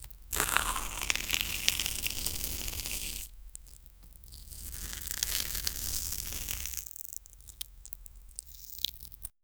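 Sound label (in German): Schleim der von den Körper, body horror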